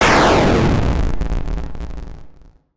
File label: Burst; Five Nights at Candy's 2